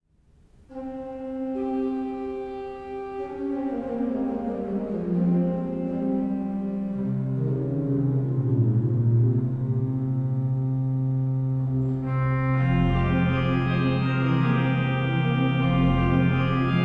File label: Symphonie pour orgue no 5; valais; Brig; Charles-Marie Widor